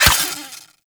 Melee Dagger Impact Robotic Flesh; Warframe; Daggers

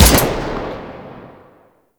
Grineer Emplacement Shot; Weapons

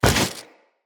Jump; Characters; Generic Foley

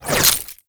Pistol Projectile Pass By; Weapons; Warframe; Tenno; Temple Signature

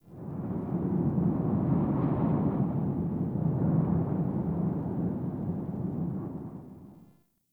Forest Half Open Cave Wind, Ambience; Warframe; Grineer

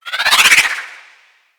Arch Wing Dodge Roll Right; Vehicles; Movement

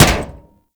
Staff Impact Armour; Warframe; Weapons; Impacts

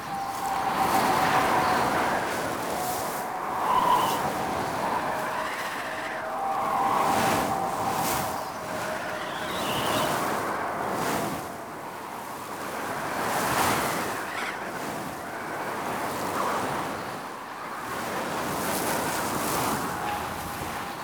Landscape Wind High Loop

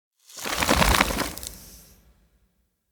Fey Wild Snare Deactivate; Powers

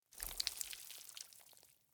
Water Shallow Exit Drips Dirt; Entity Contact Movement

